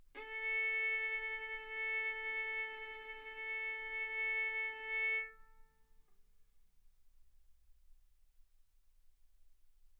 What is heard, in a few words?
Violin arco mf sul A A4 B4 stereo